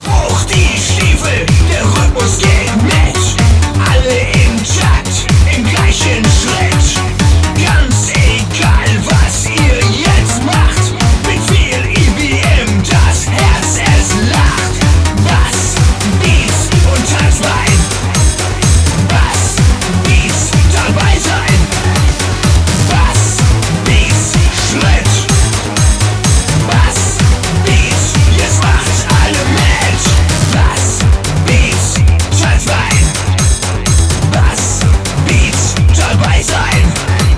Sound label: BASS BEATS